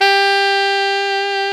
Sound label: sax alto mf